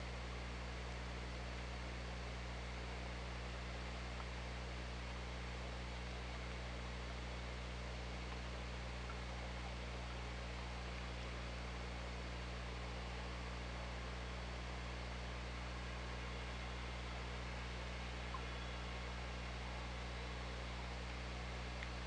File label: OrcaLab